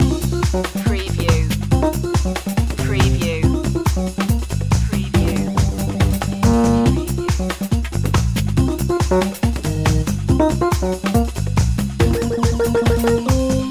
leslie jumping loop

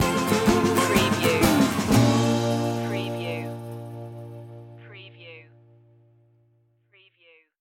tom foolery stinger

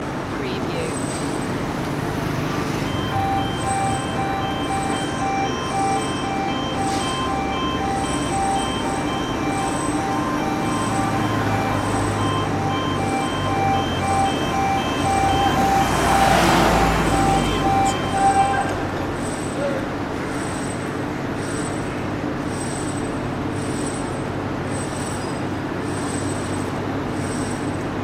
chaos city ambience loop

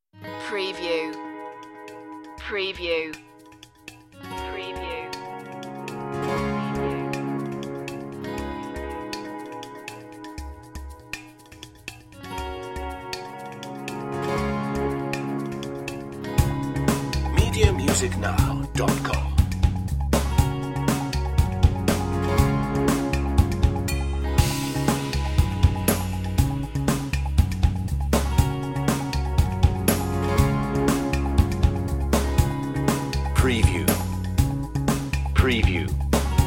underscore

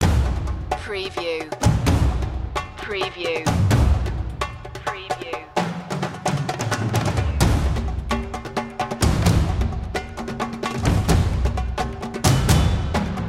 storming percussion

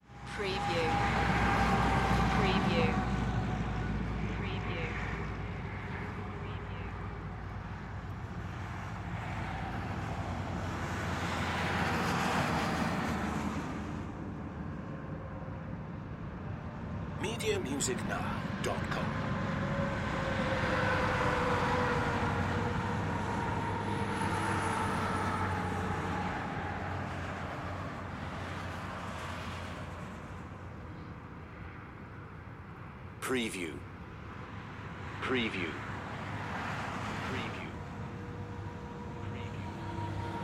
Traffic Highway

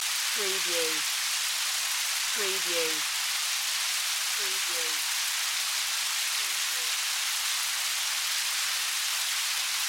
Nature Weather Rain Loop

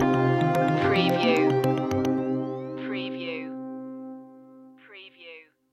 satellites stinger

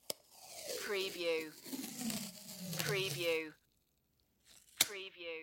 pulling tape off tape dispenser

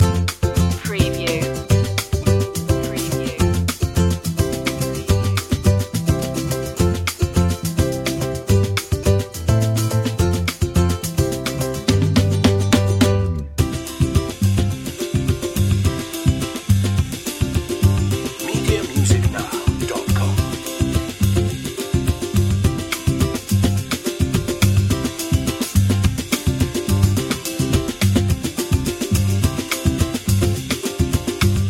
easy life loop

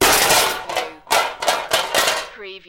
Metal Debris Impact Settle

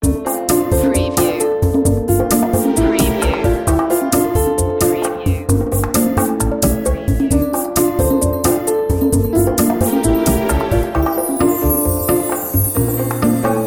piano lounge

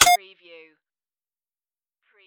keyboard

